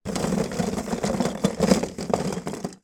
foley box of christmas decorations rummage movement; zapsplat